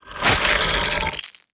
Scorpion Death

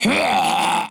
Dark Mage Death

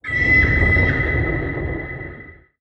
Violet What

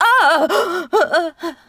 Death

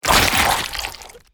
Hopper Death

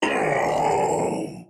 Ghost Knight Death

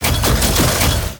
Zeus Attack

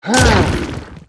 Mudman Attack

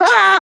Warg Death

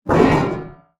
Golden Buckler Attack

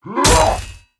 Attack; Elite Hellion

